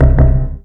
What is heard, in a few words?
health